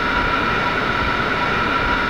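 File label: 777 turbine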